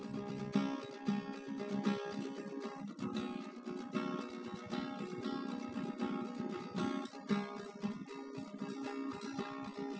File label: nodrums inst sim; SASSEC07